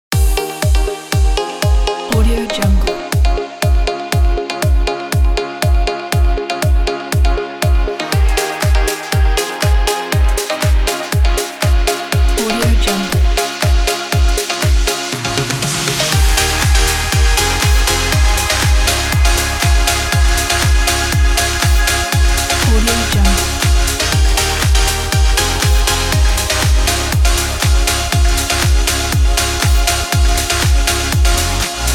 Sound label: party time